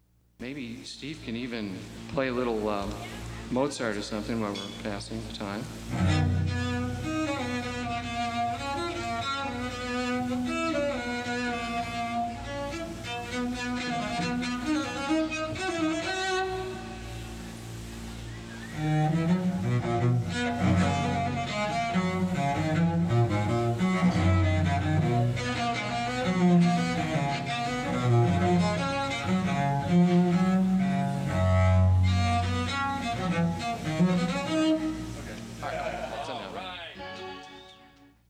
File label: Cello Interlude